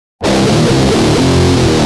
G Jam, Guitar; Industrial

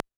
percussion, Roland TD-20

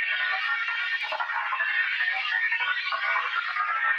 Tonal Re Draw, sparse soundscape

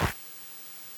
Clap, NES DPCM

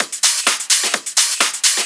Discohouse Loop 128 BPM

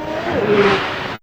Pass, Fairlight CMI IIe Effects; Effects3

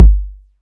BD, Dance Mega Drums

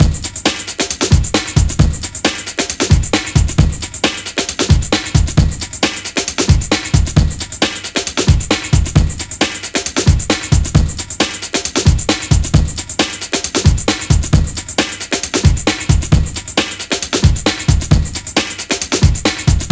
134 Foolish Rave, Breaks; Lockdown Breaks

